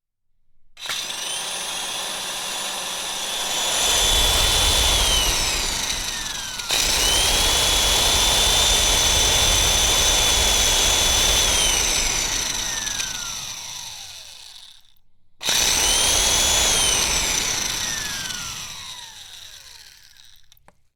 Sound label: electric mud mixer